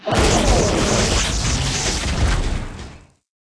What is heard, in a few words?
dead, monster